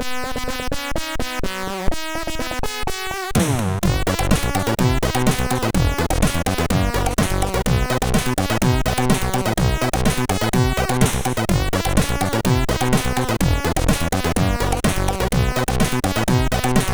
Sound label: sid MOS6581R3; Reksio